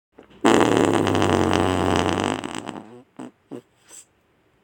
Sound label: fart random